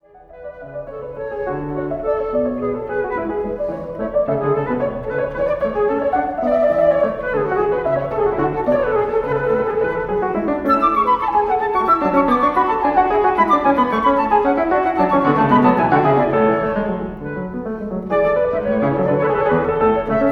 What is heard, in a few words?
Sonata in A Major BWV 1032 Movement 3; J.S. Bach